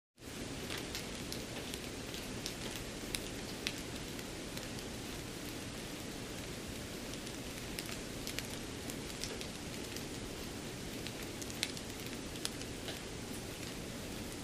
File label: Rain Light Drizzle